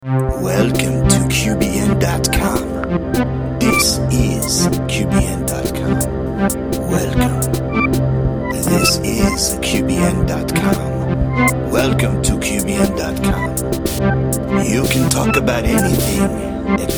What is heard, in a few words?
jingle